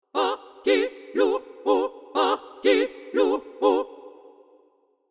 Opera Soprano Staccato; Voices Of Opera

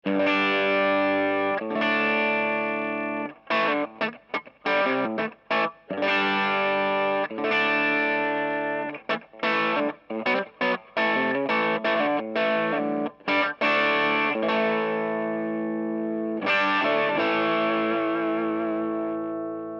full gain sw up

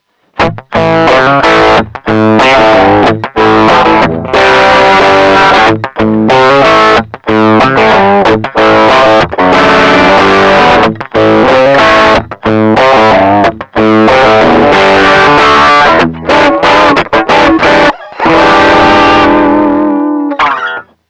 Tech 21 Sansamp Classic Crunch

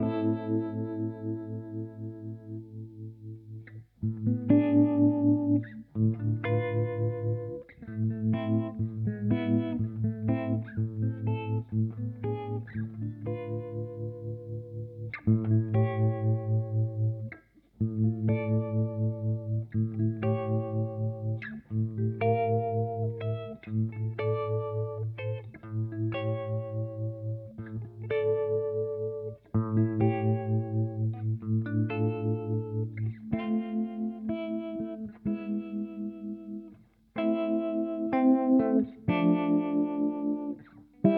Modulation Harmonic Tremolo; Boss HX Stomp

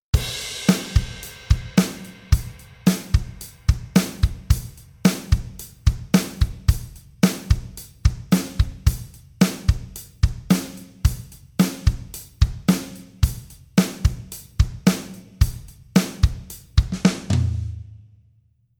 RCF M 20X Hall

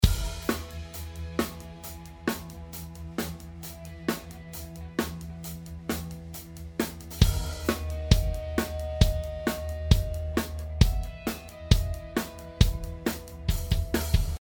Drums Snare Original

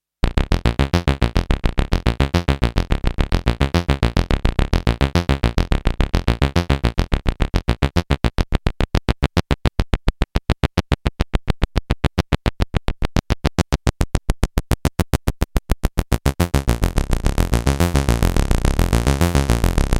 Arpeggio

